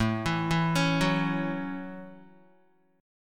A 6th A Guitar, chord; arpeggiated

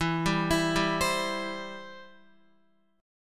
E-Minor Sharp 5th, Guitar; Em#5 arpeggiated; JGuitar

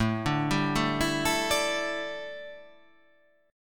A 7th Suspended 4th, chord

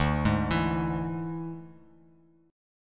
D Suspended 2nd Flat 5th D, Bass Standard; x,5,6,9; arpeggiated

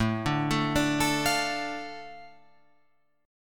A Suspended 4th Sharp 5th, Guitar Open F chord; Asus4#5 arpeggiated; JGuitar

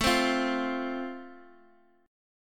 A Minor Sharp 5th, down, Guitar, Standard; strummed; JGuitar

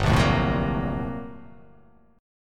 G Minor Major 11th, G; GmM11 strummed; JGuitar

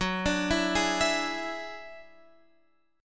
F sharp 7th Suspended 2nd Sharp 5th; x,9,12,9,9,12; arpeggiated

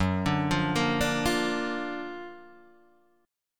Gb Augmented Major 7th Gb down Guitar DADGAD

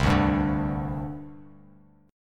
C Suspended 2nd, C, down, chord; Csus2 strummed; JGuitar

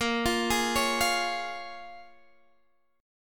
C sharp 6th, A sharp

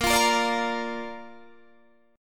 Bb Major, Guitar, Open D; 20,20,20,20,20,20

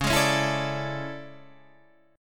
A Major 11th, guitar

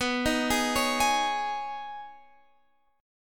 D sharp 7th Suspended 4th Sharp 5th B, Guitar

